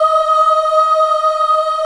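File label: D#4 child ah left, Choir; USB Soundscan vol.28; Acoustic & Synth; AKAI; CHILD AHF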